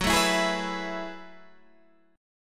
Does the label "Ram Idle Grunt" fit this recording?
No